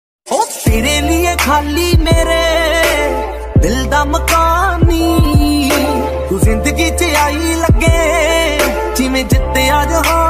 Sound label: punjabi ringtone